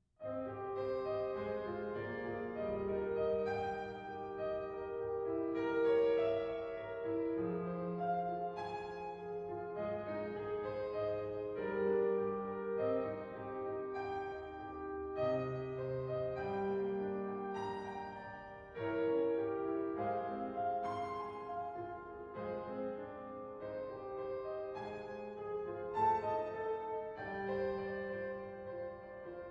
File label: Piano 480